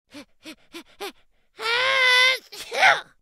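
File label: sneeze, funny cartoon sounds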